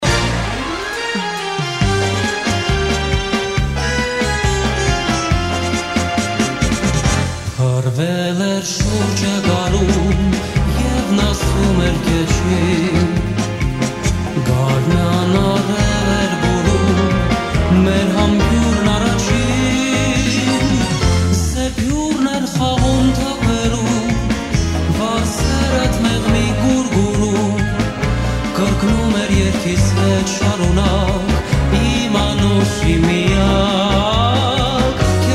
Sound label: Armenian Hit Romances; Armo Mix